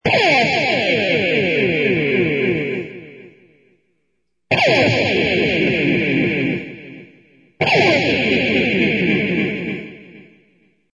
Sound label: guit